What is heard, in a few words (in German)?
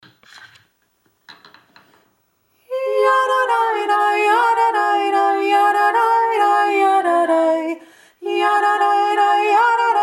jadadaidai zweistimmig